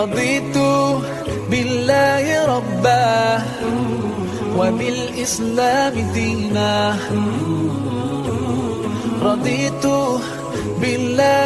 islamic

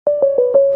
tune; State Farm

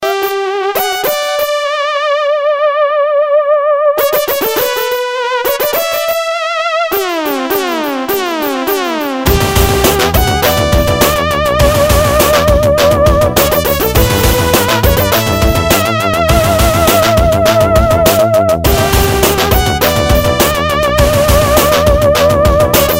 Blues

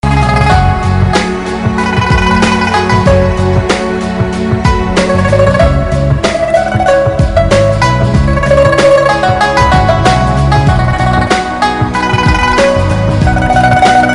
Romantic Tune

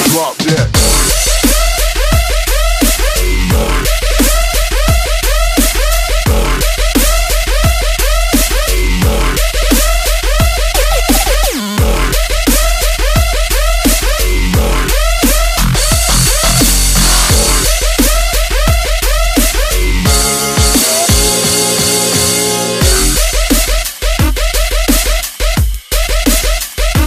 DUBSTEP HARD

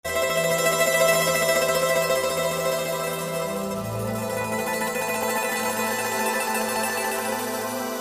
Super Duper Sms Tone

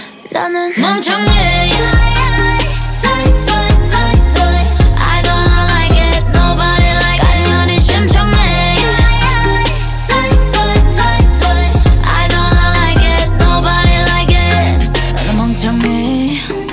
Chorus; TWIT

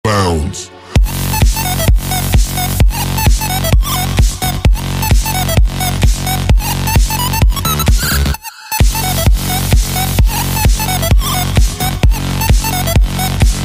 Electro Goat Bass

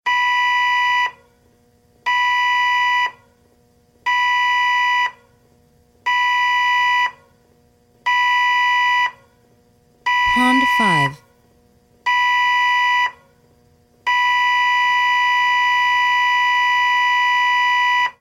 Cruise Ship Alarm

